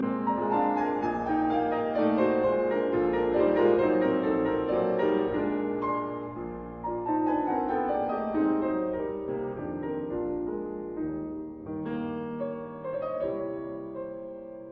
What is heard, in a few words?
Waltz in F minor; Chopin; Op 70 No 2